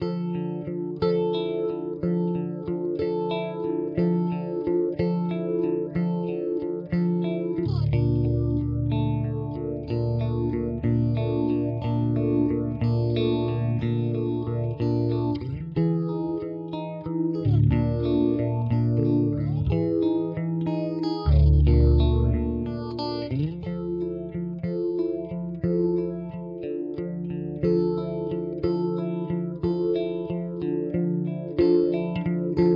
Zelzah 6 Stage 4 Stage; Strymon

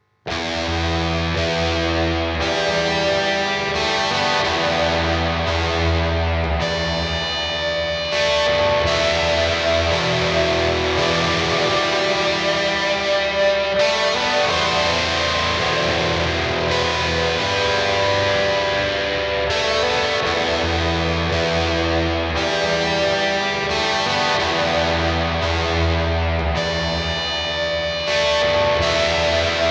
High Gain; Source Audio Ventris

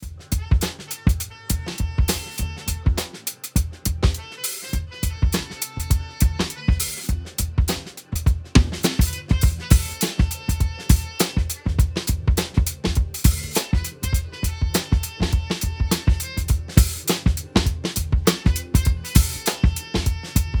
Drum Buss Transients; Ableton

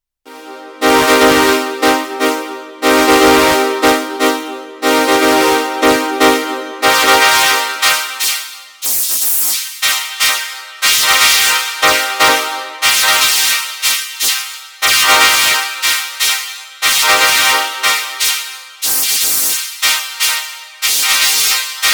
Analog Brass Filtered Phased

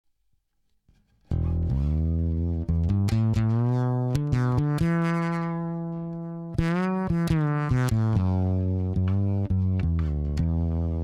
Godin Color min No Bass full Mid Full High DI

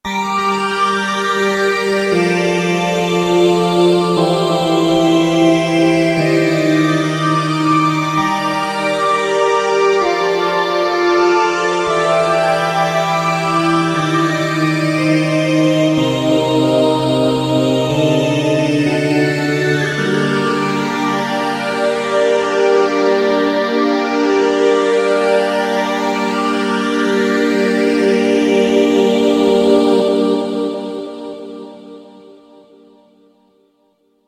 Korg minilogue FX Chain

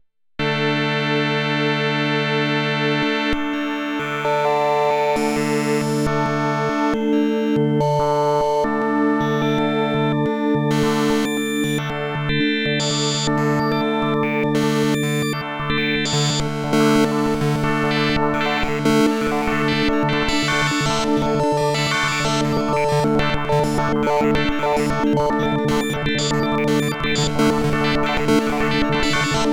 Studio Electronics Quadnic Chord WSeq

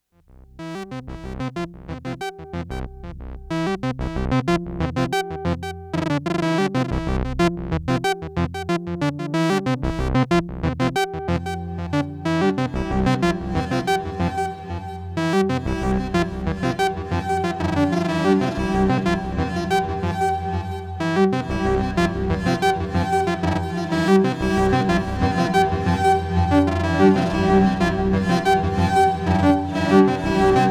Red Panda Context V2 Malekko Reverse

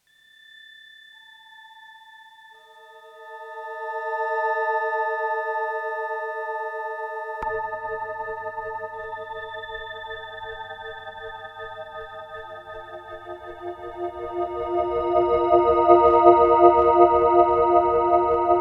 DX7 Atmo; June60

